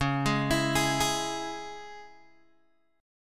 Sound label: Db Minor, Guitar Open G